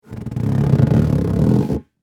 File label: Exercise Ball, Sports, Handle, Large; Miscellaneous Sports; BlastWave